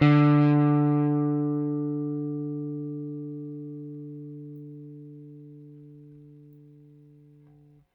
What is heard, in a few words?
Rickenbacker Bass; Airwindows